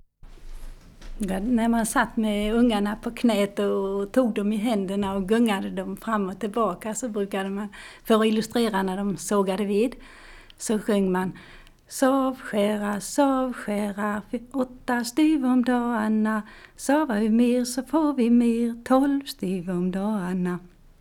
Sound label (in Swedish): Sågvisa, Folk music of Småland; Sov skära åtta styver om dagen